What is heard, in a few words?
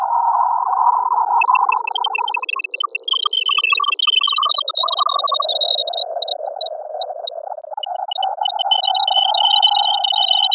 Processed Mp3 Artifacts